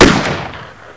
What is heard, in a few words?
pl gun